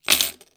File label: coins table